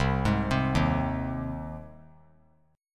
C Minor Sharp 5th C 3C,3F,3A,3D; arpeggiated; JGuitar

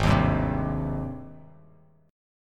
G sharp Major 7th G sharp; 4,6,5,5; JGuitar

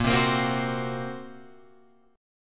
Bb Suspended 2nd Sharp 5th, Guitar Open F; strummed

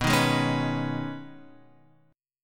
B 6th Add 9th B down Guitar Open F; B6add9; strummed

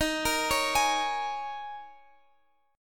Eb 7th Suspended 4th Eb; x,x,13,15,14,16; arpeggiated

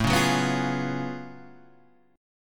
F sharp Minor Major 11th A

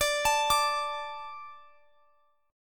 D 5th D, down, Guitar, Standard; D5 arpeggiated; capo=3

